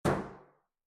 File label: Metal Footfall; soundscrate